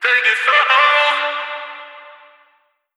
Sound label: Vocal Sample Pack Phrases G; Slate Digital Omnivox Vocal Sample Pack